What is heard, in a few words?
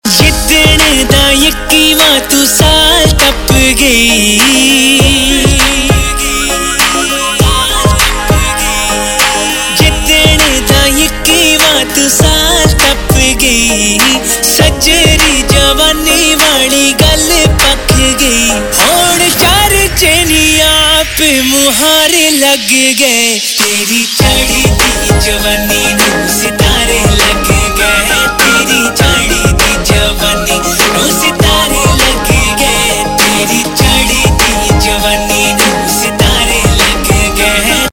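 Ringtone, Punjabi Pop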